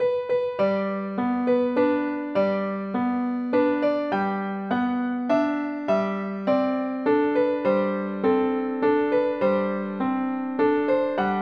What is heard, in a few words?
LULLABY